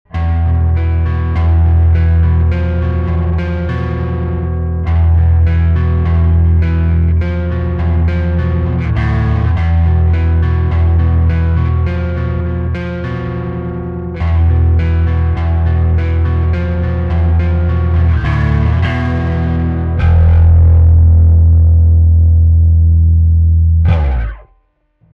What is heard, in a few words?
Drive EQ Preshape; Marduk